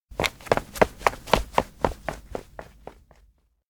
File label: steps indoor town hall run; anemoi パッケージ版 1.1